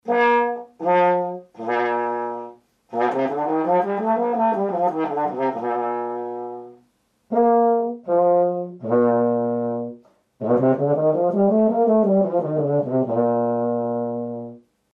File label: euphonium mute aluminium staight; faxx